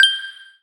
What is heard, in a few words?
Enemy Train Light On